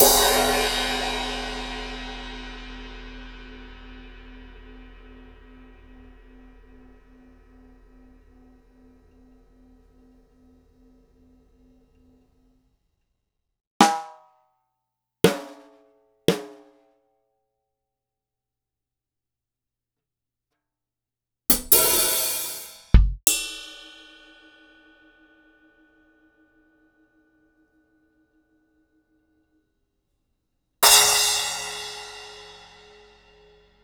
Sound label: Akai MPC500 Rod Kit